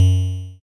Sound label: chip triangle; note; perdachcraft; minecraft